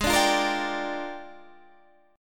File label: A sharp 13th